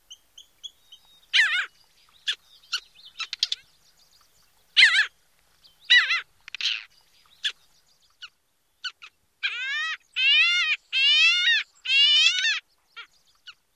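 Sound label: various calls, parasitic jaeger; AK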